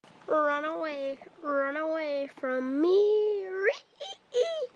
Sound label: rehehehe